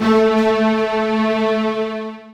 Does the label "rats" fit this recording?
No